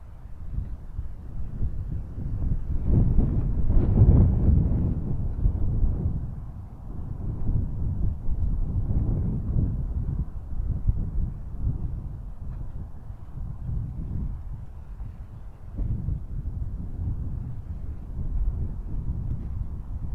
soft wind; OpenValley

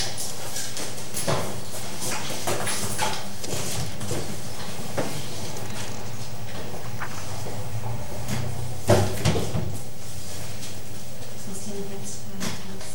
public library; OpenValley

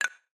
boost; IceCubes; Mastodon

